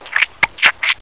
chomping

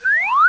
upper whistle, cartoon

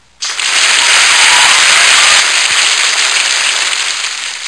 Applause and Whistles Large; Human; Soundsation

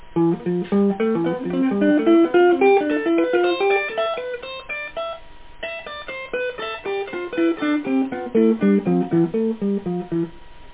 delay ton, Guitar; Guitar1.01

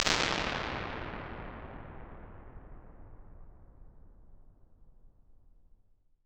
cathedral; quantec

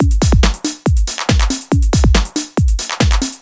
Ping Pong, Beats

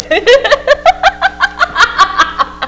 Woman Laughing; People

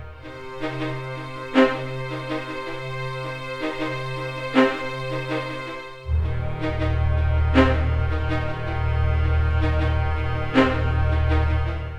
STRING D+B 160 C; eJay; Drum+Bass

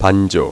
banjo; My Animated Word Book; band

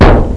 Hit bounces off; MacAddict; HAVOC Demo

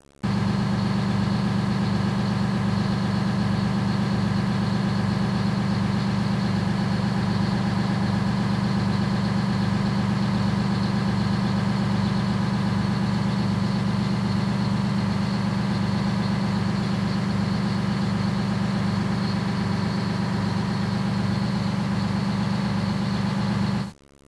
idle, fire; disaster

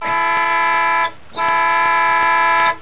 bus horn; Image Bonanza; Expert Software

